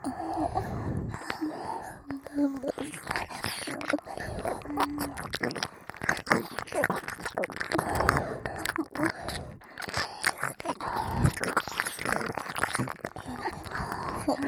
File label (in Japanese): 耳舐め,ASMR; マゾタマの錬金術師